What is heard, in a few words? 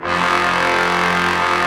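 Brass Ensemble mute; Roland L-CDX-03 Disk 2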